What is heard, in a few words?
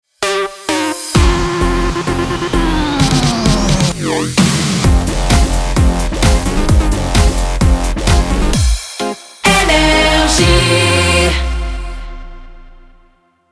Jingle Ramp; NRJ